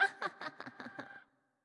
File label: Teleport; Winkubus; Going Under